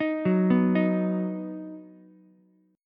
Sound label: D sharp Minor Sharp 5th D sharp down Ukulele; arpeggiated; JGuitar